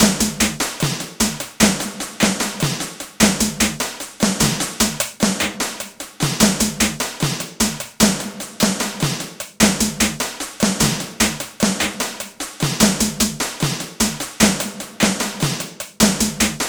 Snarez 75, Percussion Combos, Abstract World Fusion; M-Audio; Pro Sessions VOL-38; Abstract World Fusion 2